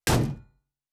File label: Shield Metal